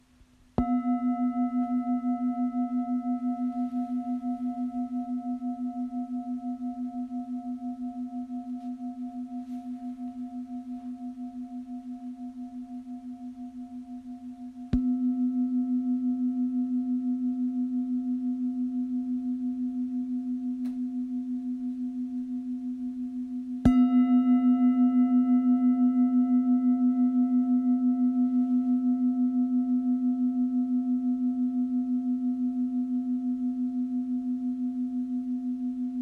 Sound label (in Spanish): Campana tibetana para final de meditacion